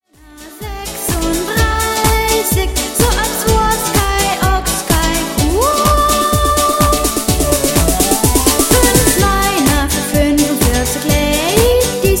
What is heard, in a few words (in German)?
touristen jodler